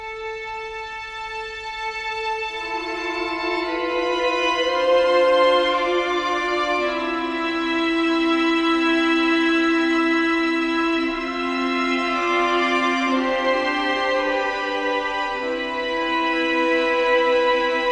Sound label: Sad Song